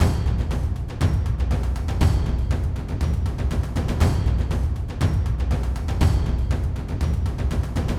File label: Drums Cinematic Energy 120